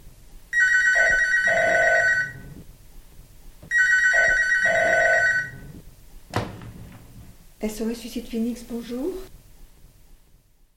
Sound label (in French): sonnerie; SOS suicide phenix